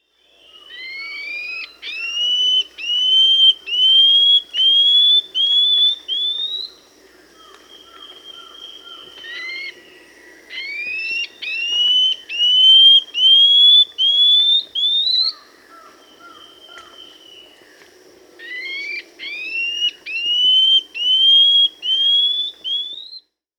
Scale-backed Antbird (Hylophylax poecilinota), Peru; S. America